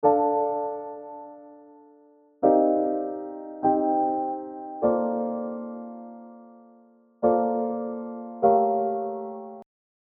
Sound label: Piano Loop Gm